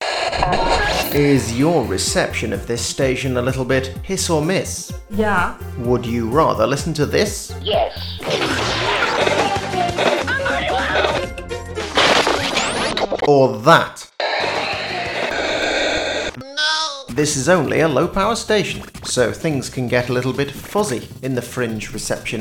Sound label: bad reception commercial